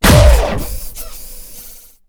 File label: hurt, cylarkan she; combat; creatures; CosmicRage